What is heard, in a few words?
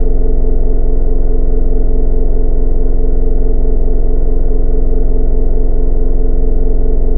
Drone Static; Drums & Drones